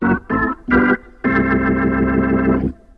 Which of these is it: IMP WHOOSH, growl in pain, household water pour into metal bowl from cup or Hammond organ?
Hammond organ